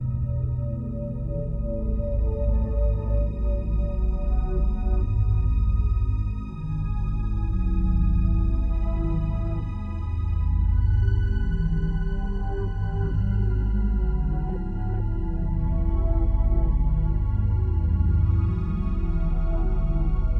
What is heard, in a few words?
sounds music; Halo Combat Evolved; Xbox